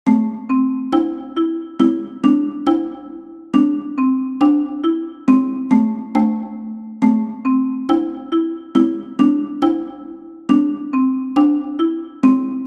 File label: Cajon; Taino Ti